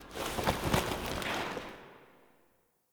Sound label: Foley Scared Back; Cinematics; Operator; Warframe; Orbiter Cradle